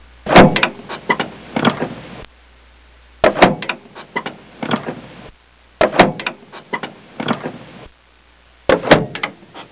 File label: Various Auto Doors; Soundsation